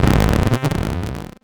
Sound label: Title Transition Communication Distortion Jammer; Adobe; Production Element